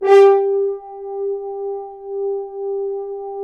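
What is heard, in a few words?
french horn, accent; Roland L-CDX-03 Disk 2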